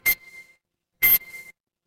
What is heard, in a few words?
office card reader